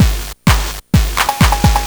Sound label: Game Boy House Loop Fill, Drums